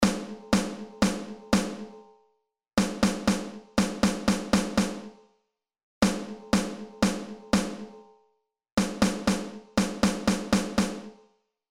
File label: Rhythms Snare Drum